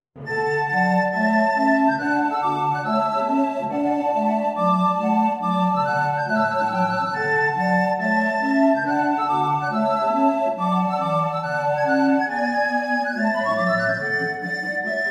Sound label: Trumpet Tune for Recorder Quintet; Terrett; from the Island Princess